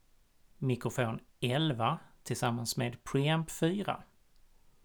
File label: mic pre